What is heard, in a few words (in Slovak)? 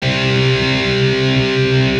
Powers akordy c g c